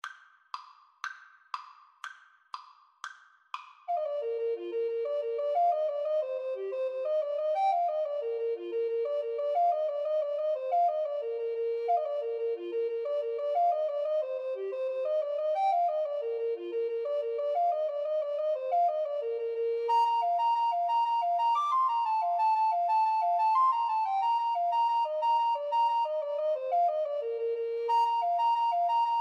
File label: irish jig medley, Alto Recorder Duet